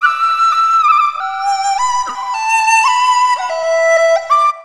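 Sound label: Chinese FLUTE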